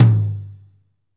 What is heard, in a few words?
tom, Pop Country; Northstar; Drumscapes Roland